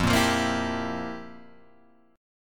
F Major 7th Suspended 2nd Suspended 4th, F, down, Guitar, Open G